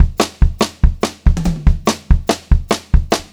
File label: 144 SPEED, Pop-Rock Drumloops; USB Soundscan vol.37; AKAI; 90 s Sample CDs